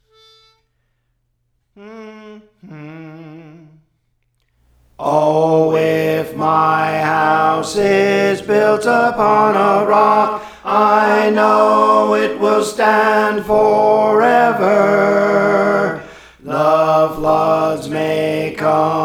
Tenor, Songs; Praise For The Lord Training

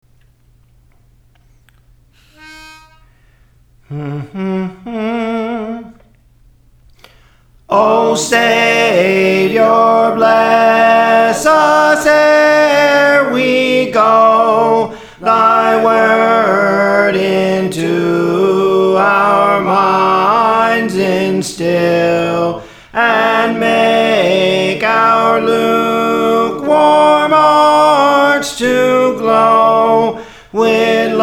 Soprano, Songs; O Savior Bless Us Ere We Go; Praise For The Lord Training

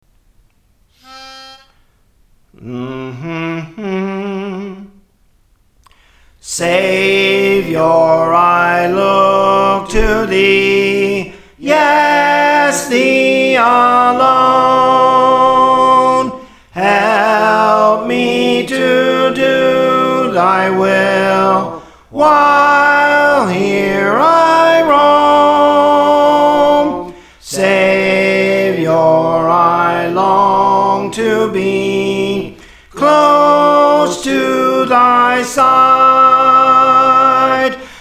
Soprano, Praise For The Lord Training

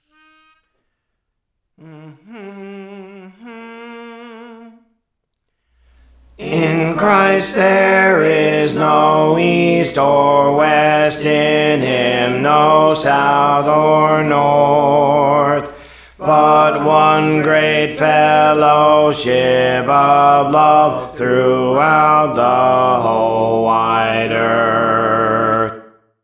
Alto; Praise For The Lord Training

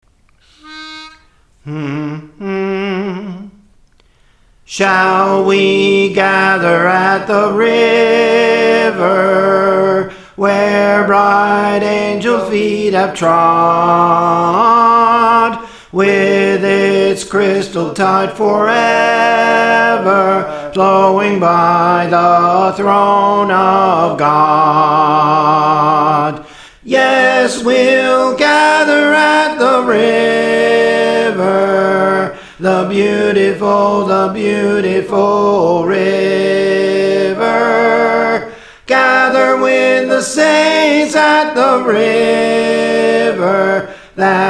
Soprano, Praise For The Lord Training

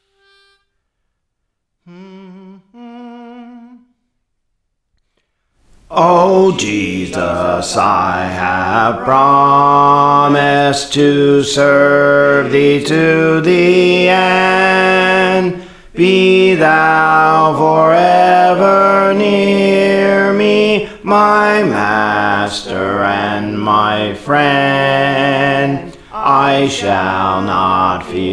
Bass, Songs; Praise For The Lord Training

